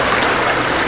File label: Game, 游戏音效; 特酷声音素材库